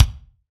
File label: BD, BASS DRUMS; EdgeSounds